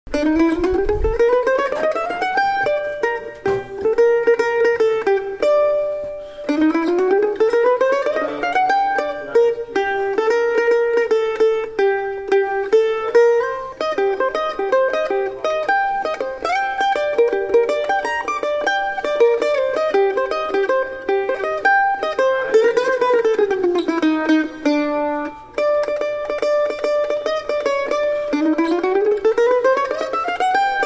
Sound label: swing mix